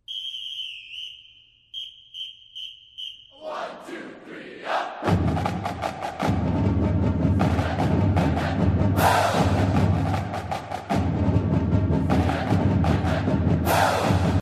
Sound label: cadence